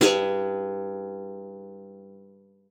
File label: G2, guitar; Xsample VOL-2